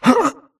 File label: grunt hits; Titanfall 2